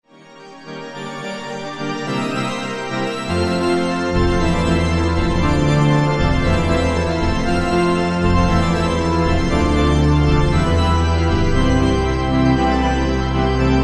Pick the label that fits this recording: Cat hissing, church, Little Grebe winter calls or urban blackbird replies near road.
church